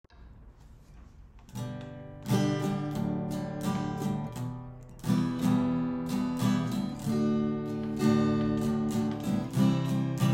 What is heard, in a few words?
misheberach